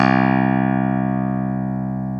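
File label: clavinet C2; AKAI S-Series CD-ROM Sound Library VOL-8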